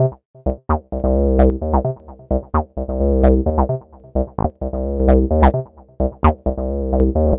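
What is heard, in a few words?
tb303 acidline, 130 bpm; SYNTH AND ELECTRONIC LOOPS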